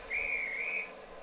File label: porter whistle